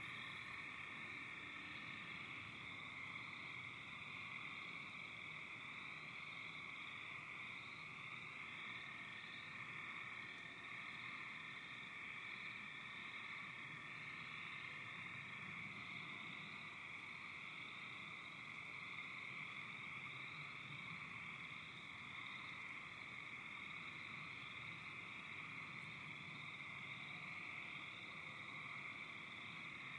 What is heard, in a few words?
frogs